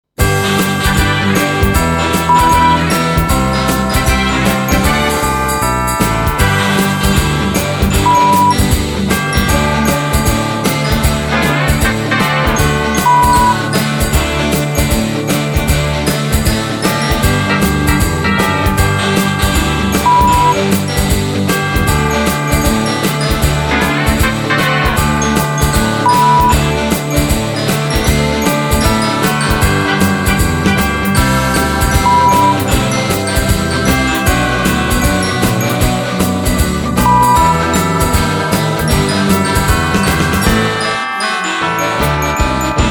XMas Rock Shuffle B

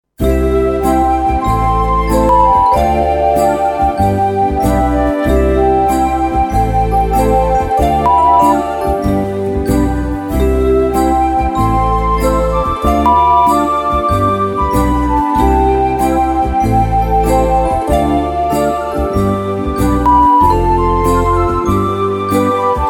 XMas Slow Swing 95; unbeschwert

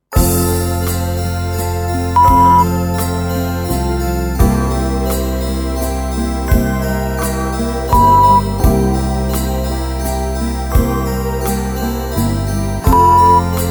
XMas Slow Walz 85bpm; unbeschwert

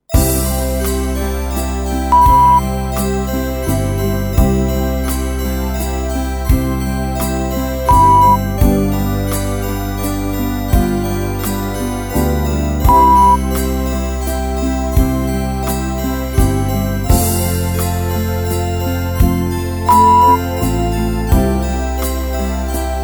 XMas Slow Walz 85bpm; unbeschwert

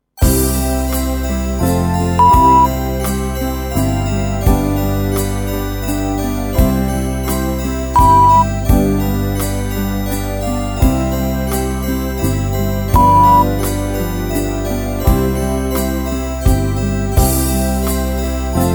XMas Slow Walz F 85bpm; unbeschwert

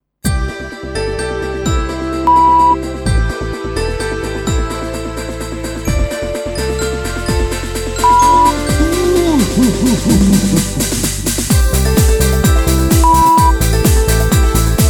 XMas Disco Pop A 128

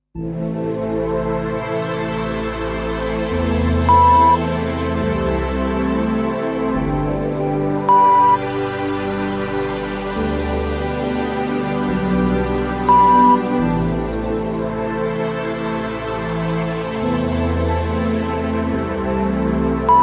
Meditation Rain Dreams 70bpm; Meditative, entspannende Hintergrundmusik / Meditationsmusik

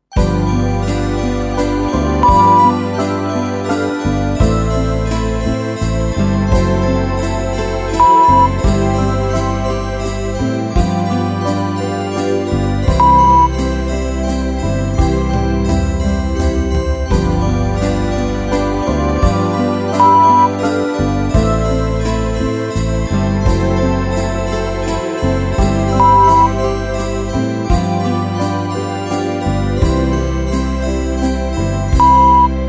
XMas Slow Walz C 85bpm; unbeschwert

